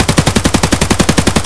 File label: aksu close loop; Escape from Tarkov